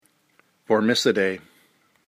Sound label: Formicidae